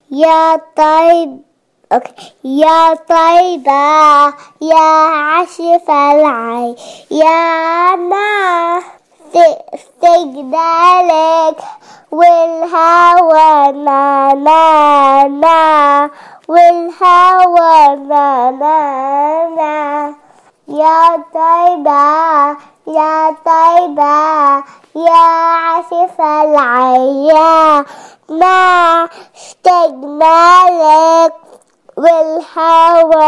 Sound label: chants ya tayba